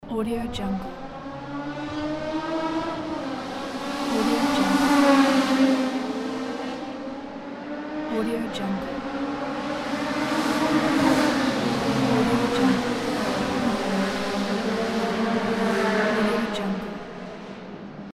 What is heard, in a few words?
fast racing cars in urban conditions